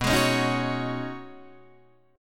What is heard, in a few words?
B 6th Add 9th B Guitar Open D, chord